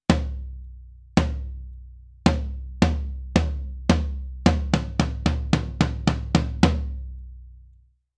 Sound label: floor tom; lewitt